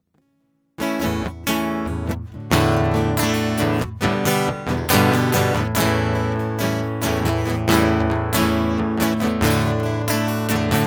Instrumental

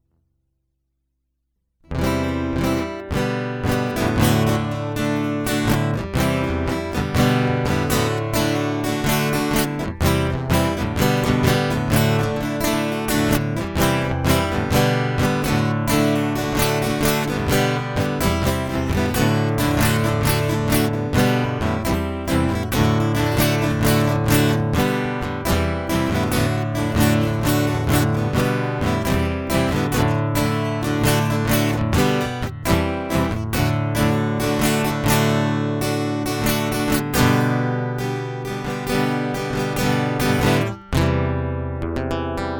Guitar Loop

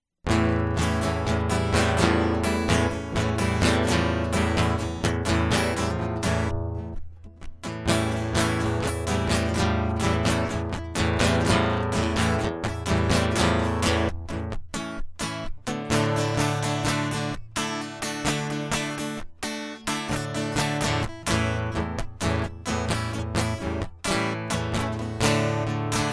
Guitar Loop

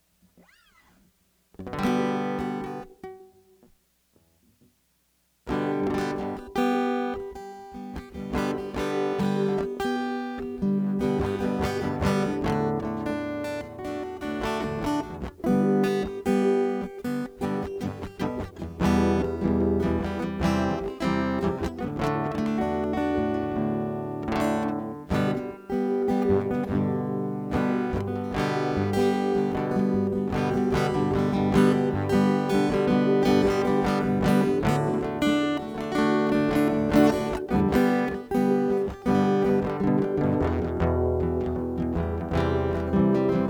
Guitar

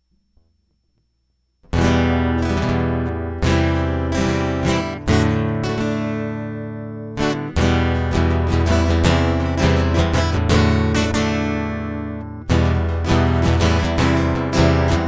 Guitar Loop

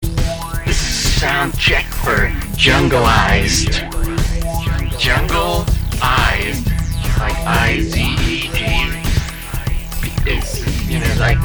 Soundcheck; Jungled

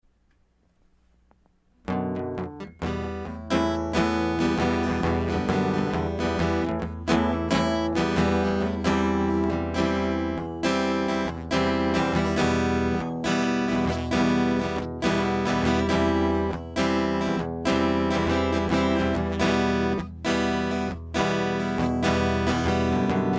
Guitar Solo

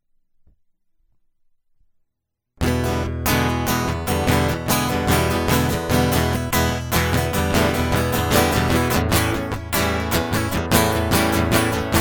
Guitar Loop

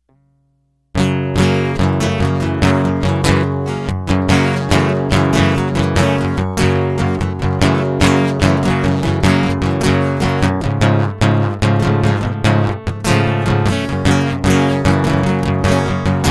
Guitar Loop

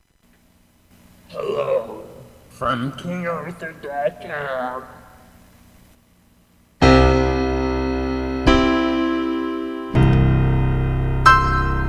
Warmup At The Piano; live recordings

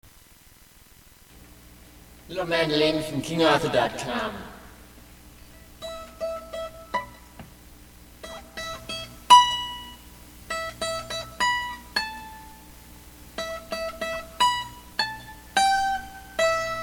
Mandolin; live recordings; King Arthur

